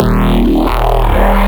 E Bass Shot; neuro; BIG5AUDIO

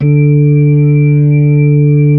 B-3, Brite

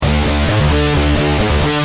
G Pump, Guitar; Industrial

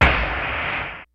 G-FUNK KIT, DRUMS; Kid Nepro; Elite

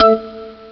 click organ, Amiga Soundtracker Sample Packs

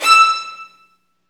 STR VNB2, Vlns Marc; Roland L-CD702; Vlns Marc1-3

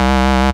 BASS Ramjam, bass; M8; Electronisounds

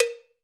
Low Cow, Alesis D4 Drum Machines

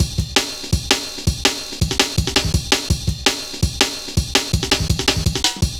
Rollin' Ride, Jungle Warfare, Drum Loops, 165.5

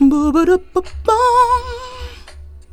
FEMALE, Hip Hop; E-MU Formula 4000 Series Vol. 1; Hip Hop Nation; Phatt Phemmes 3

